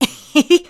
Shake Hands; Ravio (unmasked) shaking hands with Link; zelda

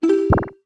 Pause Menu Page; Turn the page (Recorded)

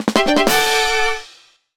Fanfare Weapon; "Get Weapon" fanfare; zelda

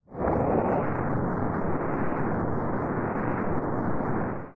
Fire Flames

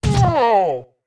Goron Link Fall Down; Goron Link getting knocked to the ground (#3 is rarely heard)

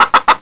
Cucco

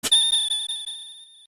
Power Up Gleam; 'Gleam' sound from some power-ups

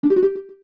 Z Target Object; Z-Target an object or character

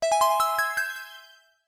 Wink; Tetra winks (Recorded); Zelda

